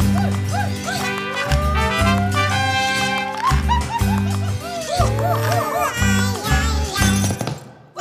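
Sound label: food world music inferno suspense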